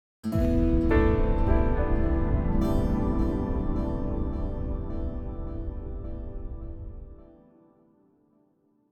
contemplative; Joe's Diner

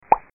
Shy Bubblepop; F.A.M.P.S

